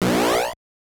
Opponent Throws a Punch; Mike Tyson's Punch-Out!!

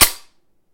Weapon Eject; Synthetik Legion Rising

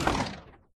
Propellerhat Desert Off; doodle jump 2; android

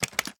Lockbox Locked; The Town

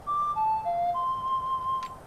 Ocarina Warp; Hyrule Warriors Definitive Edition; Ballad of Gales

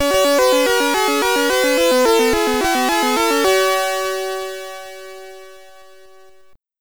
Game Start, SID Stereo; Hypa-Ball; commodore 64

